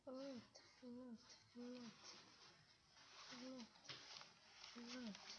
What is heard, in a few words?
flute